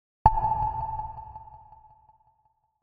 Tone

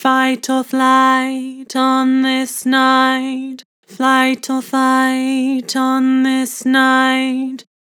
Vibrato, Vocals; Fight or flight; Harmor Vocals

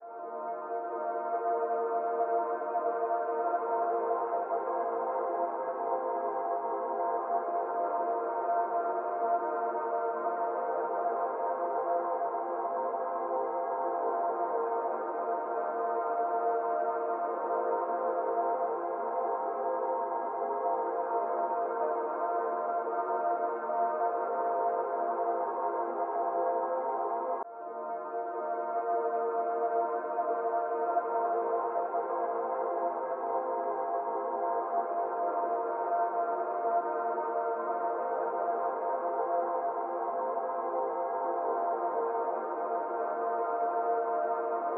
70 BPM A# Min Pad, RnB; Melodies; Desires

